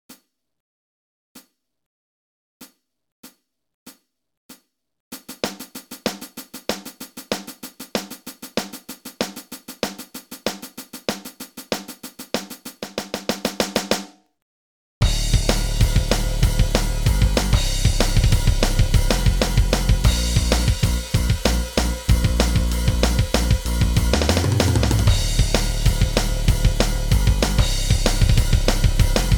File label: Backing Track 191 bpm